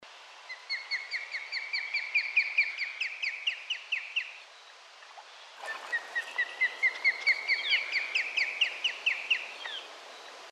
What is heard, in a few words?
osprey